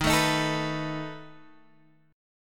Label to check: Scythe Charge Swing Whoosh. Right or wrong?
Wrong